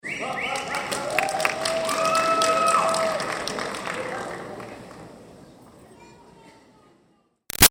Crowd Teen Grad Whistles Claps; Graduation; Reaction; Whistling; Big Room Sound

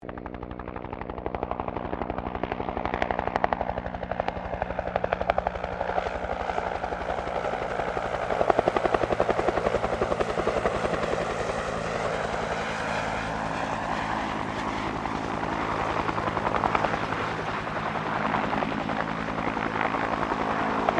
HELICOPTER 1967 BELL UH1 IROQUIOS PASS

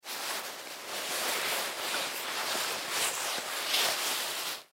Jacket Leather Put On Clothes Movement; Dressing; Foley; Blastwave

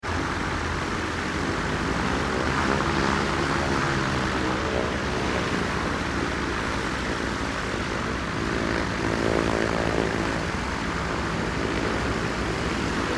ambience airfield prop runway; Airfield / Small Airport Ambience - Light Aircraft Queue Up To Takeoff; Backgrounds; Airfield Ambience 3; Airports; McKinney Sound

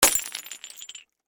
Impact, Beer, Bottle, Smash, Crash; Smash Sound Effects; Smashes (Sound Effects:Objects)

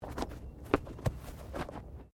Body movement Clothing Foley

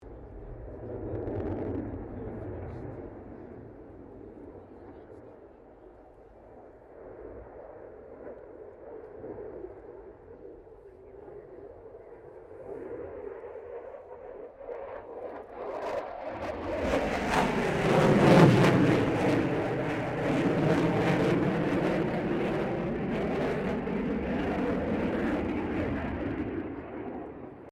AIRCRAFT FA-18 HORNET PASS

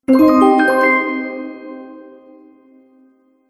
Sparkle Gliss Conclusion, magic sound effects

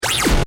Sweeper Flange Digital Short; Production Element; Sweepers

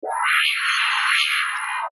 Sci Fi Alien Insect Scream; Aliens

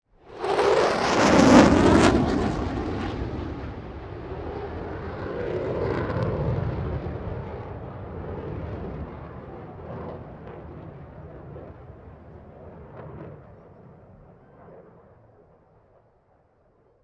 B1 Fly By Fast, Airplane; Airplanes; Vehicles; BlastWave

